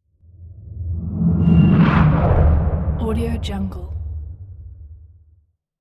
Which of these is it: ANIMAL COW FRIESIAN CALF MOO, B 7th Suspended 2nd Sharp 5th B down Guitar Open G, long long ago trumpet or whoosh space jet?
whoosh space jet